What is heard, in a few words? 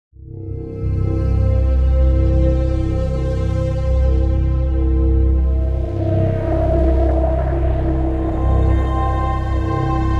Afterburn (Ambient Sequence); Unreal Tournament III unofficial soundtrack